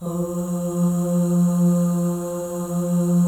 OH, FEM, Symphony of Voices; Spectrasonics

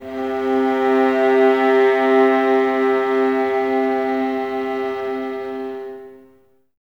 VLS C 3, Violas; Miroslav Vitous; VLS 1+2

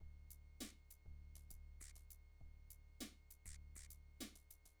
Noise Beat; Drums & Drones

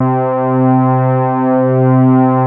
MOOG BASS C4; Best Service Dream Experience

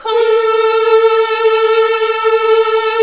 Nuns Hmmhh; Sound & Vision - Gigapack II

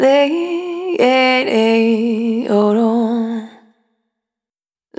Spin, Single Voice Melodic Loops

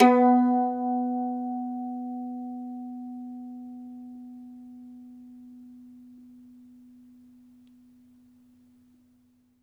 MANDOLIN, Plucked Strings

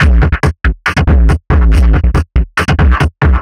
perc 140 trashed up, Percussive Loops 140 bpm

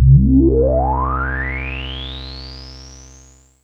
JUNO 60 C3; Hollywood Edge - Giorgio Moroder Rare Synthesizer Collection

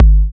Kick, Kickdrum; Equipped Music; Loungin House

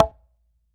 H CONGA SL; AKAI S6000 CD-ROM - Volume 5; CONGA 2

